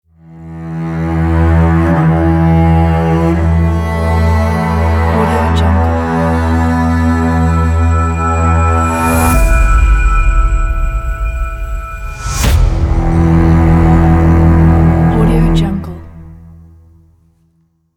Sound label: cinematic logo; audiomars